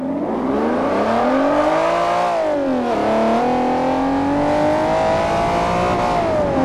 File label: first, vehicles; dbs; TDMCarpack; TDMCars